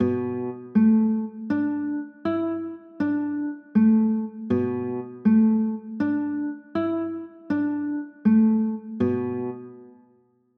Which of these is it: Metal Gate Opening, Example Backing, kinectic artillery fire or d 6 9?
d 6 9